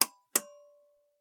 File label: household switch light pull cord domestic; sound effects one